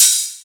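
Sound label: TR-909 open hi hat long; Hi Hats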